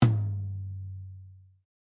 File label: Heavy Tom; REAL LIVE KITS; Osama Qureshi DrumKit